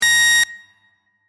Beep Simple Reverb, A Simple Beep With Additional Reverb; Multimedia; CraftedSignals